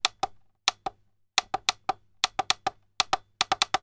office parcel scales digital button plastic press mulitple